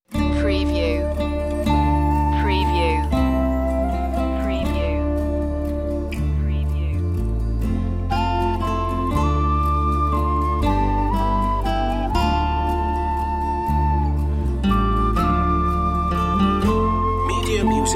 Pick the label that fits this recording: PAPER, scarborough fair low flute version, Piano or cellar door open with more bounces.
scarborough fair low flute version